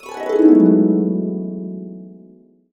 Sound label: E MAJ, Harps; USB Soundscan vol.62; Complete Harps; AKAI